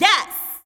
vocal phrase wet "yas"

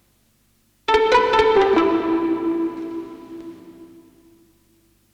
music loop macabre candelabra pizzicato 70 A min

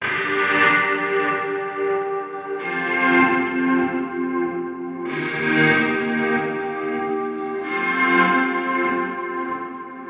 guitar loop electric 95 Gmin; ANDRS MRCIO; whales

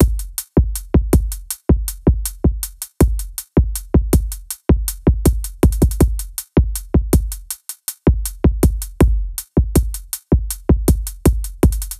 Drums, 80; Thistle

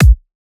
Kick Shot

